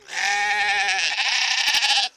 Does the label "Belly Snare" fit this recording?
No